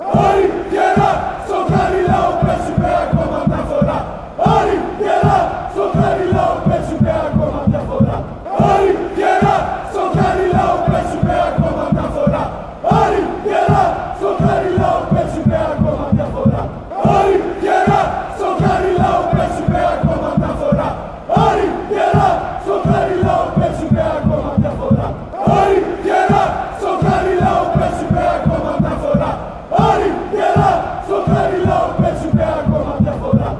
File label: chant, Greece